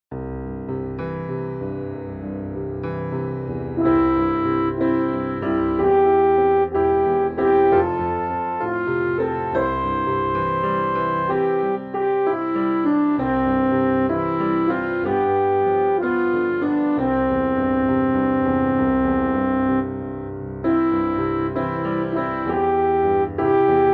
melody, choir; O Holy Night

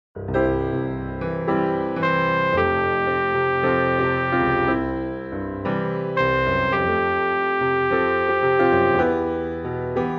backing vox

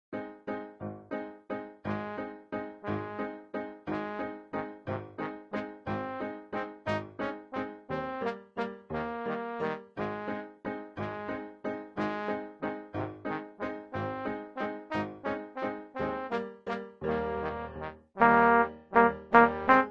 ALT, choir

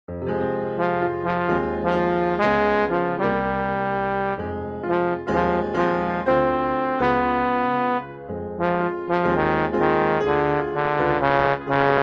bass, choir

